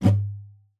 GUITAR FX; Xsample VOL-2

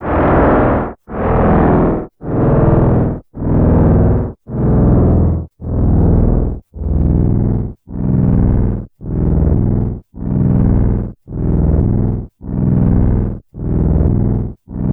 suspense, special FX twisted; USB Soundscan; AKAI

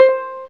V Pizz Hi C5, Fairlight CMI IIe Pizz Str

